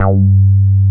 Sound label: Synth Bass, Analog Bs